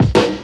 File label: synthetic, Drum loops, Kick Snare